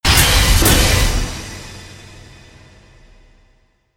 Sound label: short sting